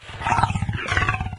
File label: idle, doom3 pinky